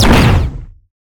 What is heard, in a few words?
divine sphere; battle